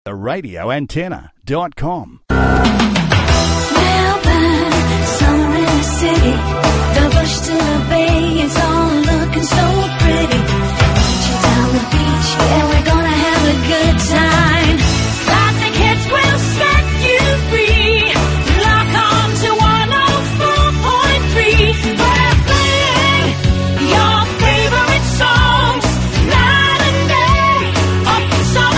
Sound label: classic hits jingle; Aircheck; 104.3 GOLD FM